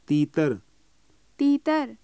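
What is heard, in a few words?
partridge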